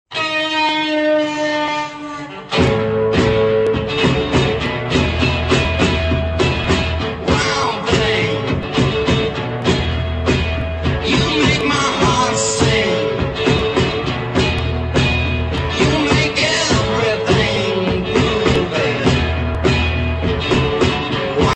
rock ringtone; iphone 7

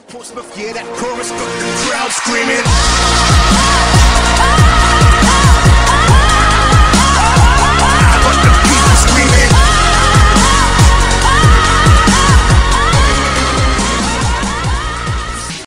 ipnone x plus high beat ringtone

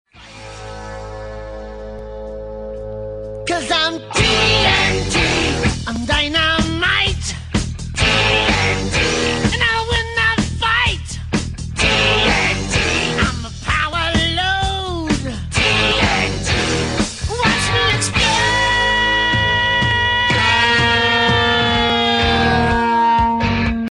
rock ringtone; iphone 3g s

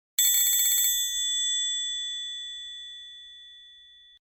iphone 3g antique ringtone

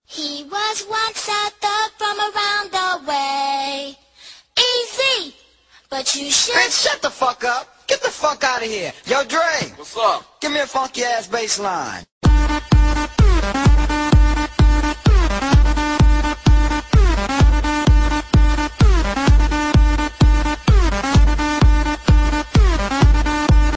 shuffling mix iphone 6s remix ringtone

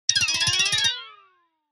iphone 7 wally ringtone

